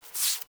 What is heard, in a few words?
Foley Idle Lick Furr; Enemies; Warframe; Catbrow